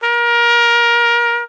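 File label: cornet fx; Musical Instruments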